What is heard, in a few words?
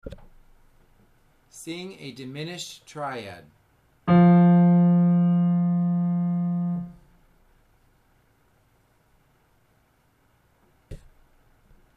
Triad F dim